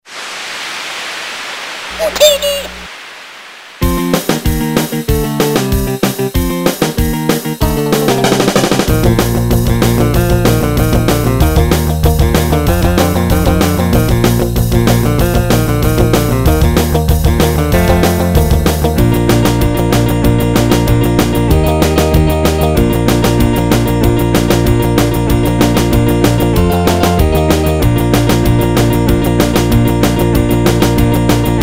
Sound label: Surf